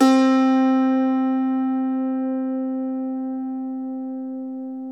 IRISH HARP

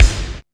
KICK

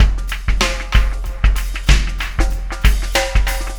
Oscillation Beat; Sonic Foundry (Sony Creative Software); Processed Drumkits Zero Gravity Beats; Oscillations

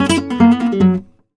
f#, Flamenco Guitar Picado; Picado 1

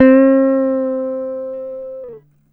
GTR TRIK, Jazzistic; Univers Sons

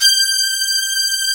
G 4-VI S.H, VIOLA SECT; AKAI S6000 CD-ROM - Volume 1; Strings

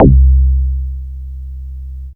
Single Electric Basses, Dance Floor Bass

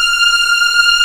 F6 LEG, String; East West; The Ultimate String Collection

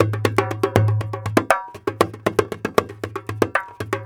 Dumbeck, Percussion; Sonic Foundry (Sony Creative Software); World Pop

